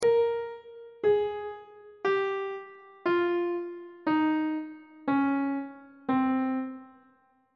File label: c phrygian mode descending on treble clef